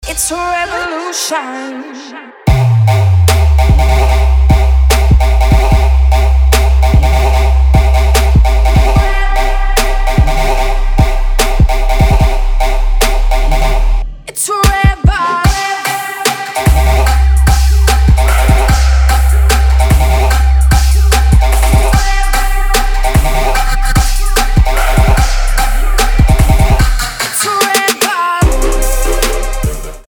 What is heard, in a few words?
Trap Ringtone